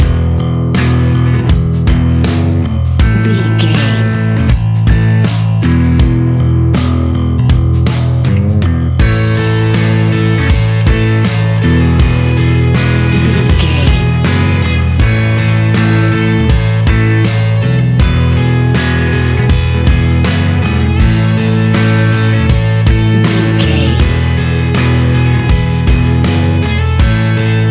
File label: pop rock ballad superstar; Catch 22 Music Network